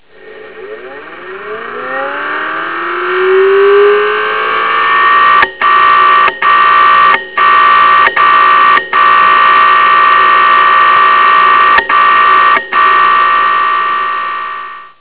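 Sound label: Bad Spindle Motor, hard drive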